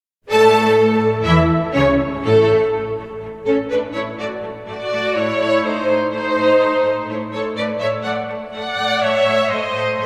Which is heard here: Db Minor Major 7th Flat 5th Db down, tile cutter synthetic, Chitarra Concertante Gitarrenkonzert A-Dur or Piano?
Chitarra Concertante Gitarrenkonzert A-Dur